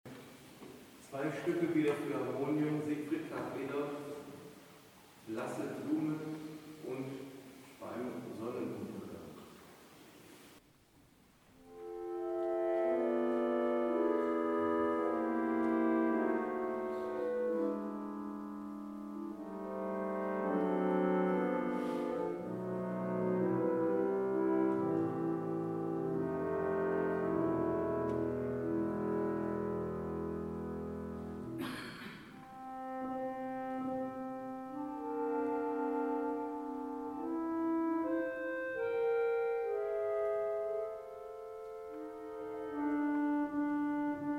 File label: Harmonium